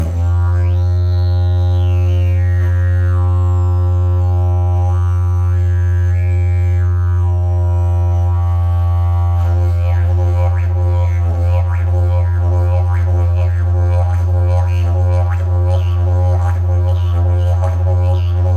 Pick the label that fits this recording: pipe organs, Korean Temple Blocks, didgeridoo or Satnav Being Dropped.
didgeridoo